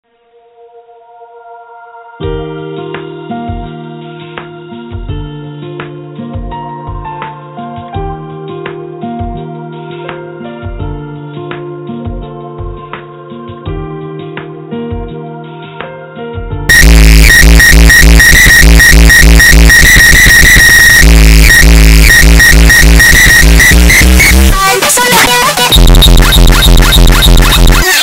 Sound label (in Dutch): rustige wachtmuziek